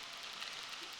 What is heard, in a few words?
Background Noise Clips